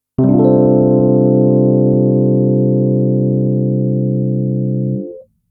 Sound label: susadd6